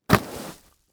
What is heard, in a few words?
Impact Body Fall Slide In Leaf Debris